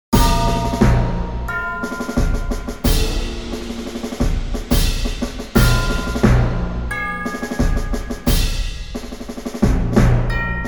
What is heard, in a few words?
Drum Cadence; Super Trench Attack